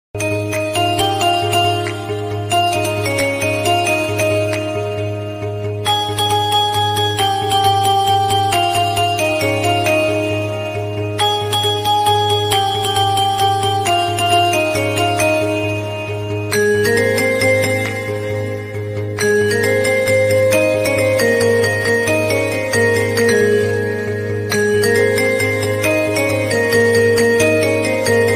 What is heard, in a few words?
instrumental status; aai ekveera